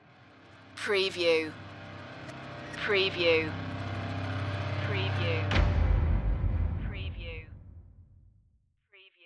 industrial eeriness stinger

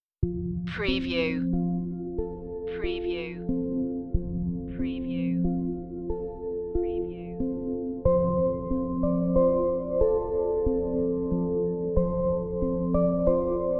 silent night keyboard only

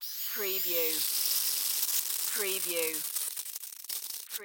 Nasal Spray Squirt

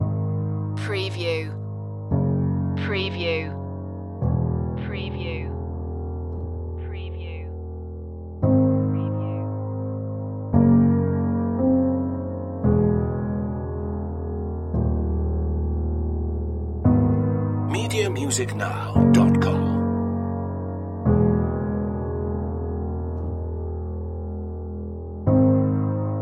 searching for peace loop

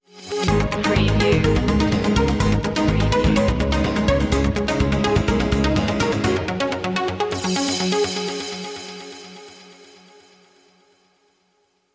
latin nights stinger; media music now

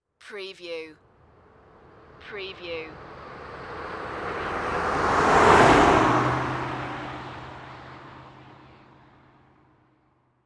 Auto Pass By Fast; sound effects bible

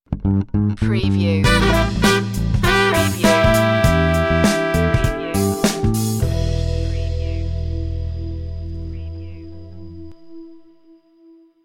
funkin is easy stinger

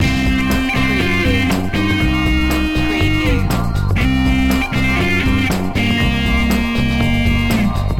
beatnik loop

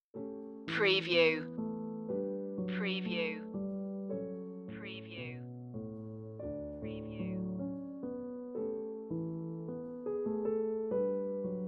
prelude d major piano; scriabin; prelude 5

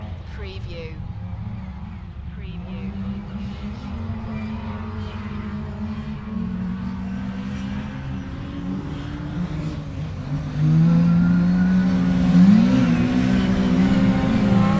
Car racing on grass distant perspective; beanstalk audio

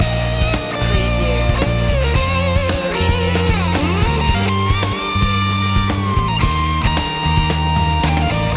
city escape loop chorus solo no piano

